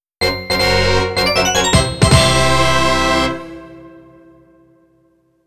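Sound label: FANFARE (CM-64 Arrange Version); Namco Sound Museum from X68000; CM-64 Arenji Baajon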